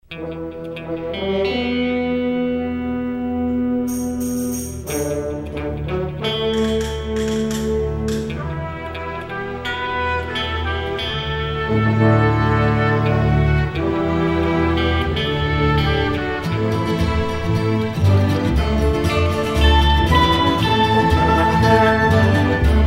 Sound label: orch